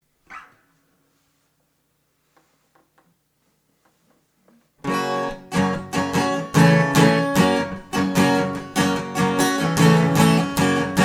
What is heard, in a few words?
Instrumental